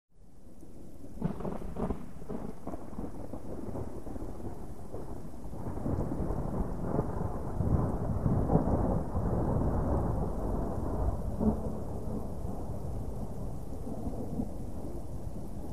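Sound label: Thunder Continuous, distant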